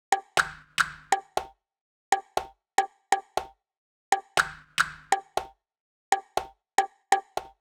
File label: Conga Loop 120, Perc Loops, Loungin House; Equipped Music